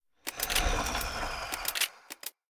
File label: Mech main, monster; steamer crazy